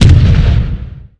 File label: HIT; NIHILIST